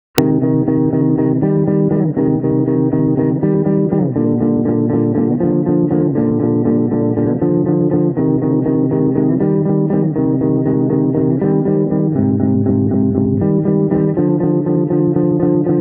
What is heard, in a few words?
electric guitar, shifting